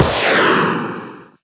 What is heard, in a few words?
missile; gmod; lvs planes; weapons